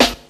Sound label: airy sizzle snare g key